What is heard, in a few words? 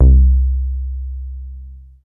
Bass Long C; VEC1; Club; Long Basses